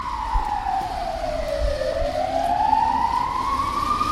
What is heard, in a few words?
hospital; anxiety; NotDotTeam